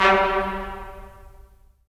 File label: BRS, Tps Stacc; Roland - LA Composer vol. 4